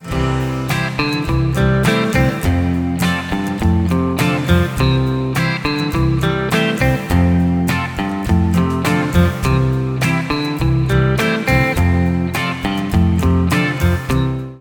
Backing Track

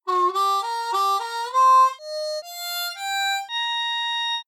Low end on C Harp adding 6OB the Bb Flat 7th; 1st Position Blues Harp; 1st Position Blues Harp – An Introduction (Part 4)

